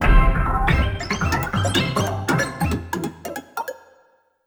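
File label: synth; Evolution 076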